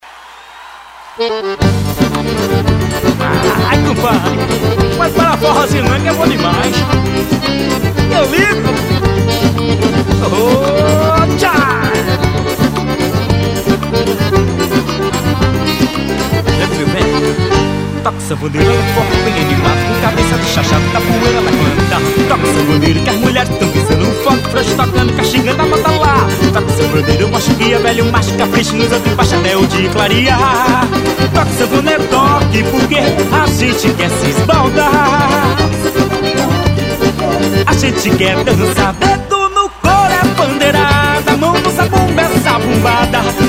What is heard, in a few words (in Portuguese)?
toque de fole